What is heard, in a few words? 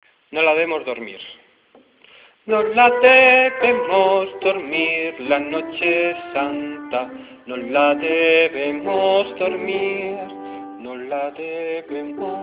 soprano